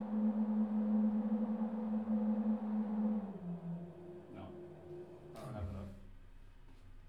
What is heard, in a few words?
Box; EMPAC